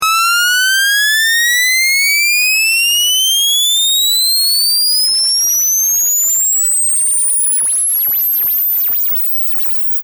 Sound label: saw sweep 20-20k, constant 4x oversample